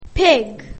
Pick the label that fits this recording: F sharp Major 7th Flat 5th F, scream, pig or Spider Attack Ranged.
pig